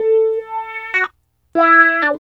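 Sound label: GUITAR 2; East West - Phat & Phunky CD1; 90's Sample CDs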